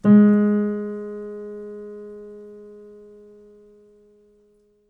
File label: piano sample